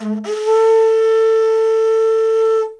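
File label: CONCH, CONCH SHELL; Spectrasonics; Heart of Africa 1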